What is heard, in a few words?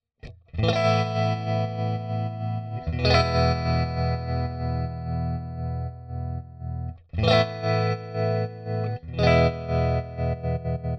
Tremolo